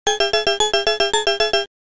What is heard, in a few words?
Time Out Warning; Dig Dug; arcade